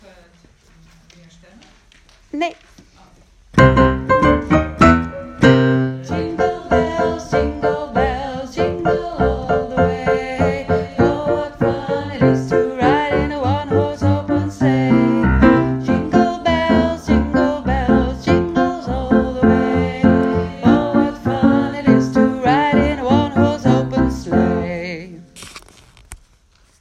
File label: Jingle Bells Alt